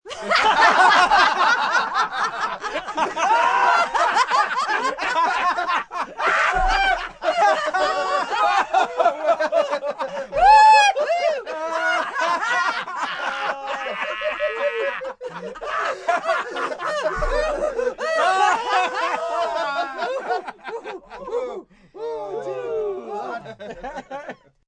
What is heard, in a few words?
Group Laugh Lite, Laughing, group of 5-10 (30-something) friends, loud, belly laugh, long; (human voice); VoiceBox